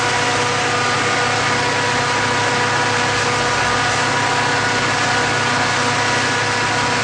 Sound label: Motor, Train; F40PH-2 x3 13 Car Superliner Long Distance Pull